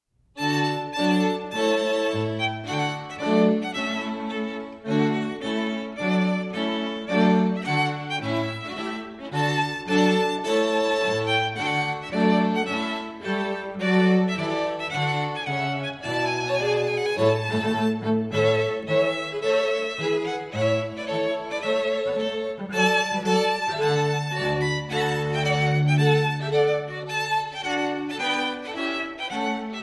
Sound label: Trumpet Tune; Purcell